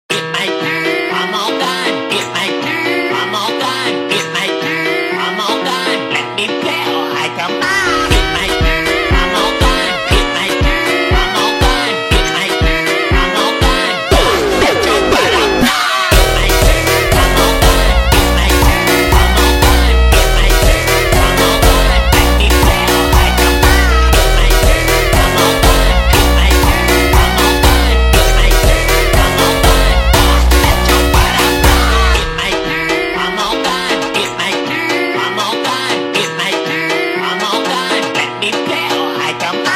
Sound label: Jumpstyle slowed